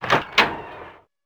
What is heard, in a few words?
door wood open; left4dead